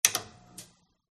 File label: switch off tv